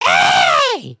double wave, emotes

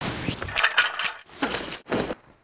Pet Slide; creatures pets

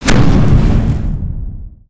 Siege Tower Fire; destructibles

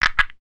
Frog Hatchling Creek; creatures organic

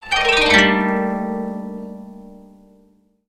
Music Strum; Dragon In A Box; destructibles

